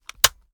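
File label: weapon selector off; gmod; foley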